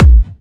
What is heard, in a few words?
Bassdrums Clubby Kicks; Vengeance Samples